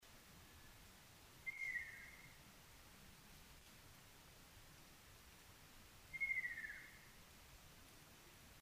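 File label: ruddy kingfisher; akashoubin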